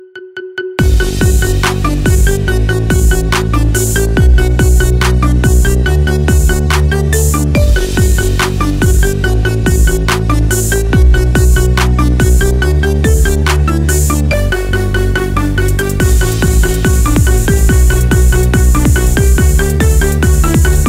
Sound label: Marimba Cover